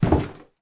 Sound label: foley footstep wooden staircase single; zapsplat